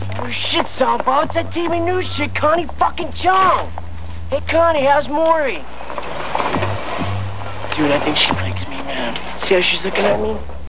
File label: scream